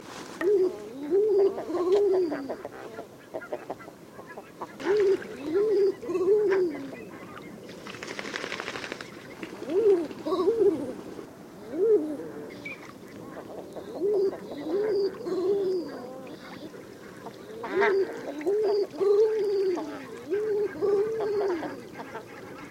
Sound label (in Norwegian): PRAKTÆRFUGL HANN SANG